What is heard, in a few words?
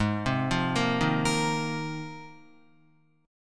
Ab 6th Add 9th, Guitar; arpeggiated; 4,3,3,3,3,5; JGuitar